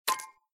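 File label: Button Adjust Bet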